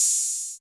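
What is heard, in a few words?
808 open hi-hat, TR-808; Crypton - DrumBase5000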